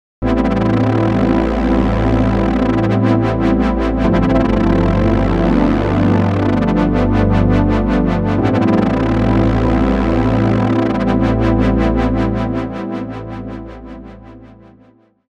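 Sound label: speeding up down LFO; opx pro3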